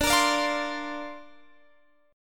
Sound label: D-Minor 7th Sharp 5th D Guitar Standard, chord; Dm7#5; strummed